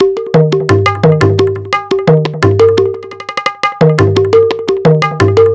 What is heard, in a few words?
Tabla Effekts, percussion loops; Drum n Bass; KJ Sawka; Drum n Bass & Beyond; Drum Tool Box